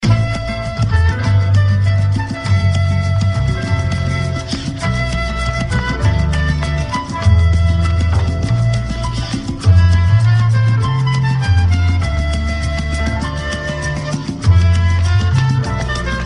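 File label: ringtone; piter fm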